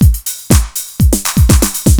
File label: 909 FILL LOOP, BREAKBEATS